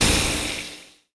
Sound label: boing; gusanos promode